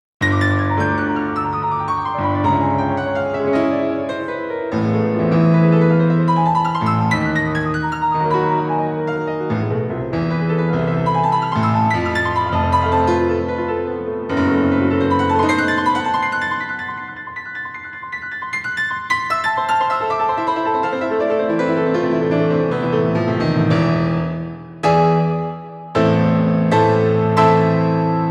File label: Piano